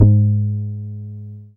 BAS FM DX-7

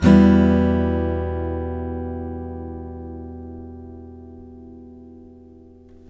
MIN E, Blues Guitar; ILIO; Fretworks

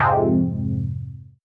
Fm Dub Chord

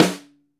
ST AMB SN, STEREO AMB SNARE

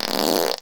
fart

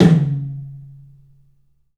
TOM, 3D Drums, CLUB TOM; 3D Sound

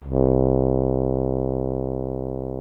BRASS 2 C1, ORCH BRASS; Greytsounds VOL-1

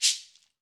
Ahfuche; Club-50 - Foundations Roland; Ahfuches

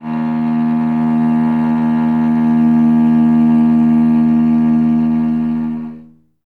Cello solo D#1; Best Service ProSamples vol.51 - Classic Orchestra 2

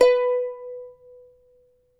CHARANGO; Spectrasonics; Hans Zimmer Guitars VOL-2

